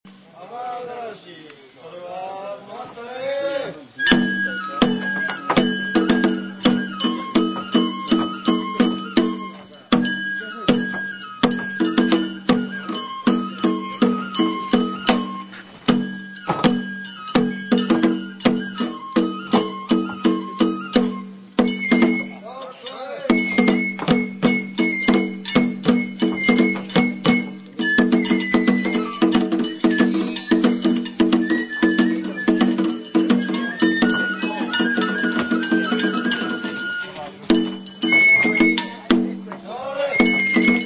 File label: banjo